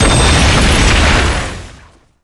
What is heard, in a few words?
Grenade; MilkChoco